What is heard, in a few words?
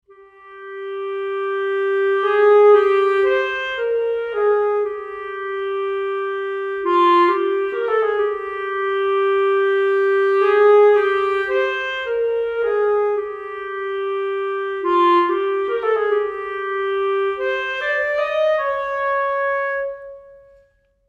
clarinet 1; sampled woodwind instruments; Berlin Woodwinds; Orchestral Tools